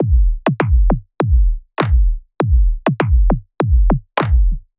Electronic Kick Wet

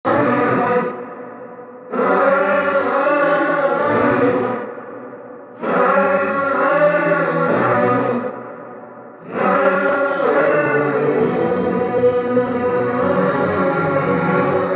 Choir Dry

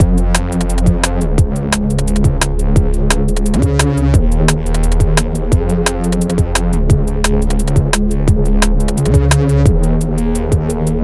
bandpass style filtering; Time automated

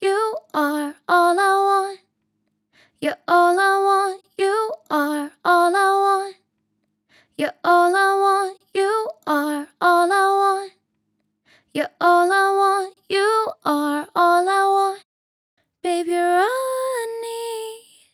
female syrup DRY; metapitch

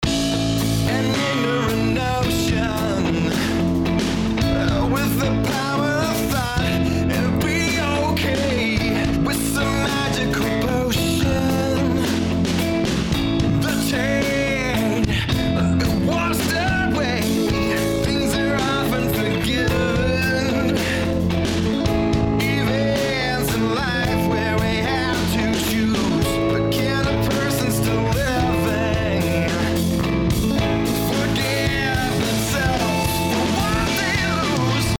full mix; fg-stress; Slate Digital

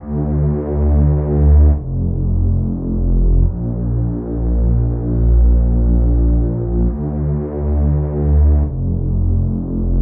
Synth Bass Dry

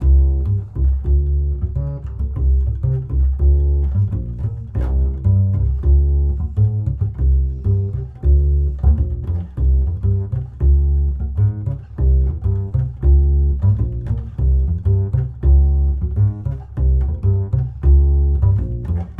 Upright Bass Dry

